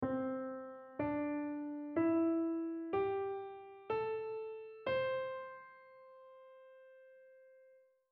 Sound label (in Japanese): 呂音階